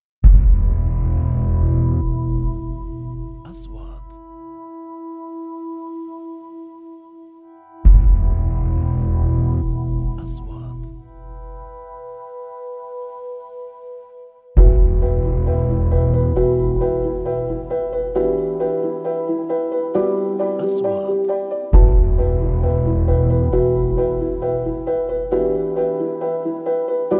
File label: Trailer inro; مقدمات تشويقية